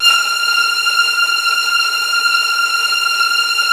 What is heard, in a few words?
F3, Virtuoso Strings